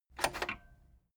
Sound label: cassette eject click fast